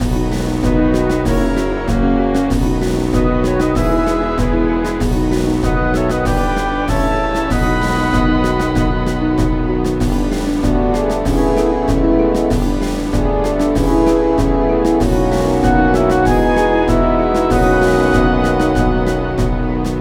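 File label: victory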